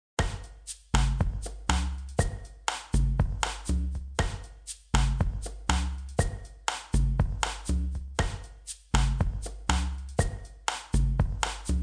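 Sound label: Drum Percussion, Bossa Nova; Surround Session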